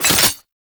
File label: Wrist Gun React; Warframe; Tenno; Incarnon